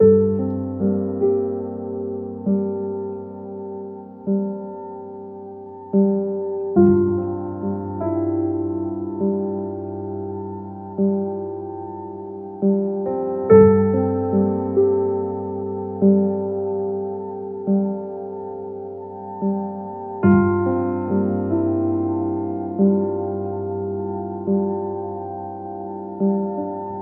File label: piano, Loops Sad